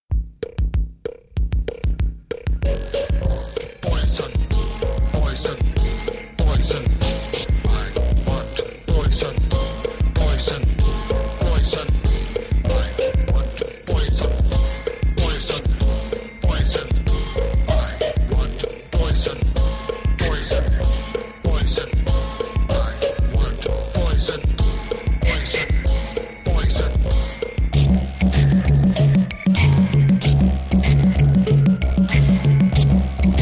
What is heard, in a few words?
Trumpet